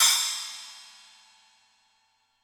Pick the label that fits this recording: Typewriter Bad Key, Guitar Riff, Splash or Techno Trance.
Splash